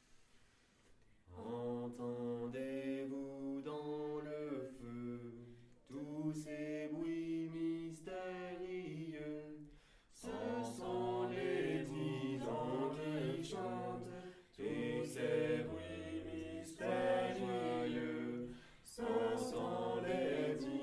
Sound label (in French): Entendez vous dans le feu canon a 2 voix